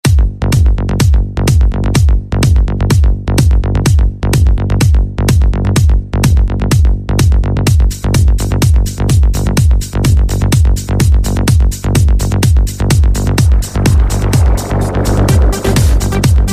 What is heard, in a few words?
electro ringtone